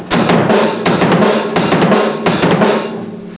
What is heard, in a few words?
drum